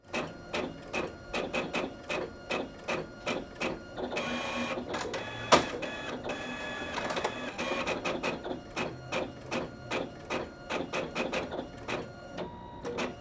printer, machine sound effects

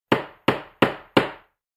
hammer

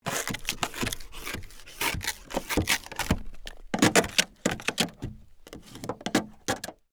window ice scraper

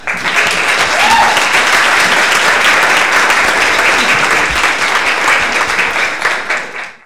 applause

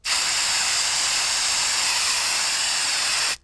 spraying

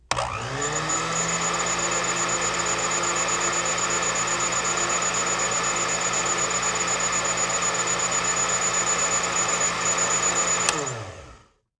mixer, machine sound effects

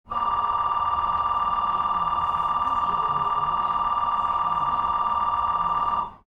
metro door alarm

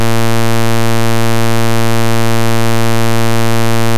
beep

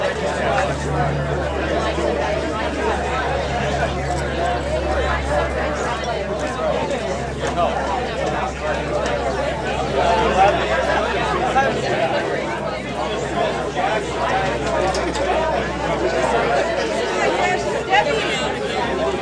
crowd outside, ambience sounds

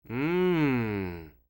mmm, voices

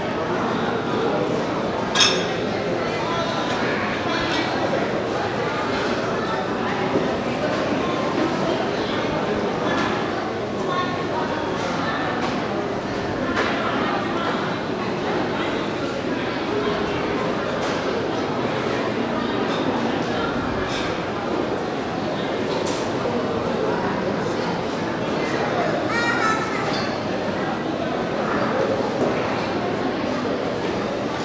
food court, ambience sounds

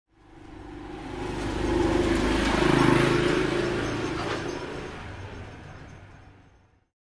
moped

